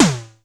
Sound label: SNARE, DRUMS; Music Store Sound Service; Einstein